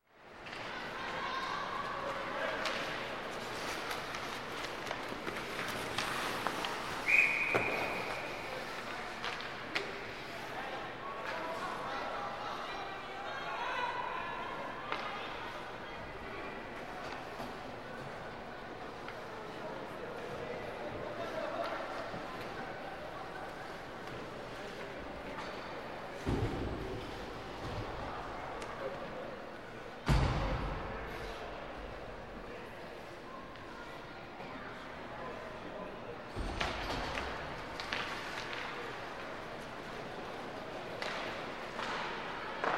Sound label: Hockey Ambience; Sound Ideas 2000 Series